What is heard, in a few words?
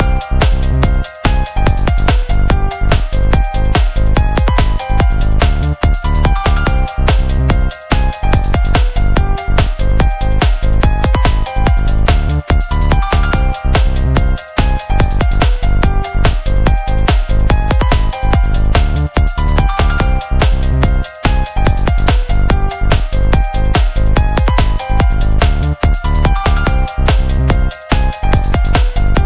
title music; cosmic protector